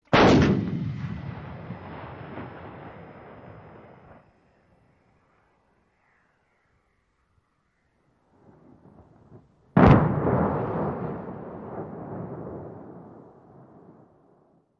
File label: 8' Howitzer: Fires With Distant Impact Explosion. Stereo